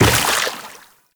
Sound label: Tenno Run Water, Footsteps; Warframe